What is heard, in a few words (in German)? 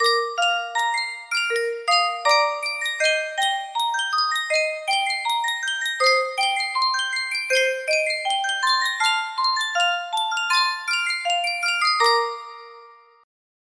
yunsheng spieluhr